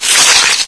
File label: web door break; ambient; source engine; ep2; outland